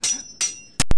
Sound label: Skeleton; DarkDemo